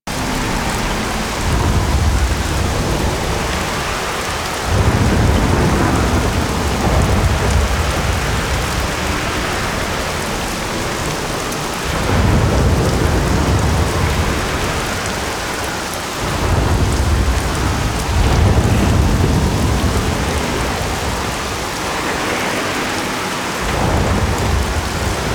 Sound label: Storm Loop; Warframe; The New War; Sea Lab; Spawn